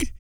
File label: DEEP BREATH; Spectrasonics; Vocal Planet; Blues Soul